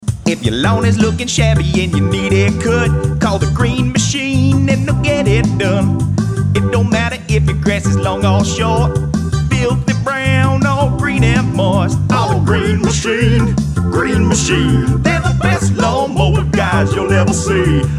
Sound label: jingle